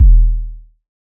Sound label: electronic kick short; soundscrate